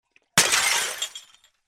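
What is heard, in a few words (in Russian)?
something thrown into broken glass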